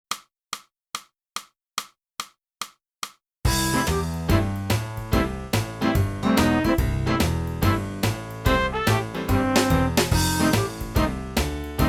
flute, millers crossing; Boosey Woodwind Method: Flute (Book 2)

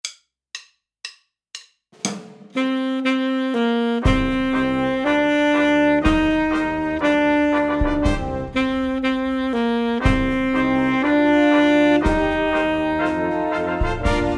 March Full Sax

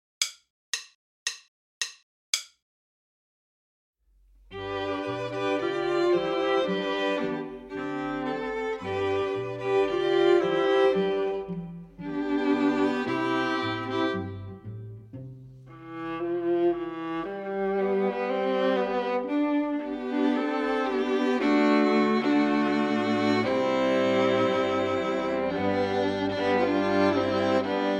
minus Cello

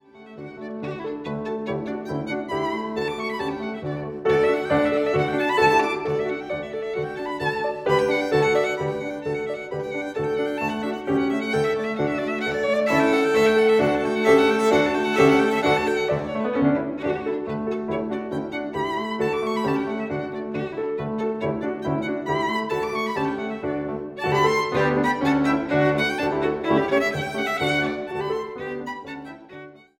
VIOLIN; Trinity College London violin exams for 2016-2019; Leclair: Tambourin (4th movt from Sonata in D op. 9 no. 3)

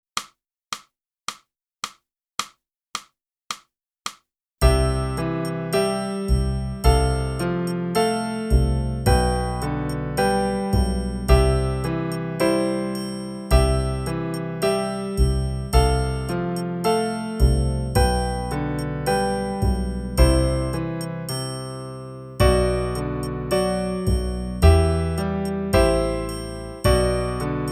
flute; Boosey Woodwind Method: Flute (Book 1)

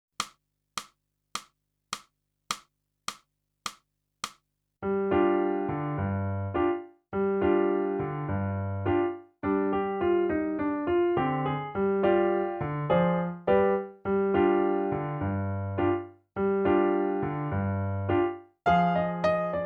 sax

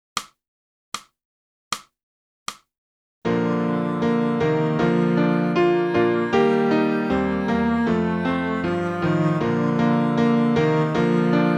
sax

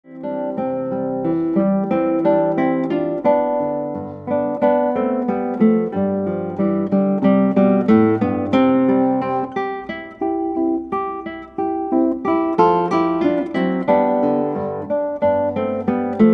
Guitar

